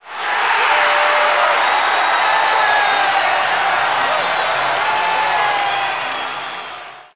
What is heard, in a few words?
clap, stadium; hockey95